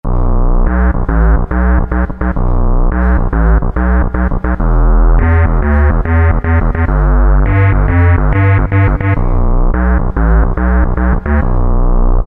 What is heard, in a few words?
JUNO RGBASS; Akai SG01v